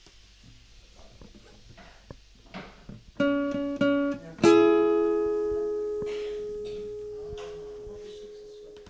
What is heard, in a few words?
SOlo